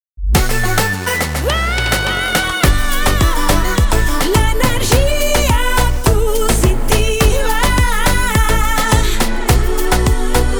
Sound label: Urban